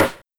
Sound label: Linn, LinnLinn Drums